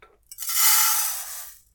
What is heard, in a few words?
put rice in pot; cooking